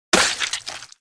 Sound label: fruit Slice